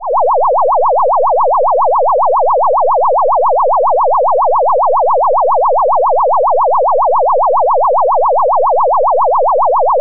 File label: UFO Sound; Asteroids